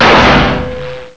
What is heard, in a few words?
metal